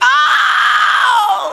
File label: scream female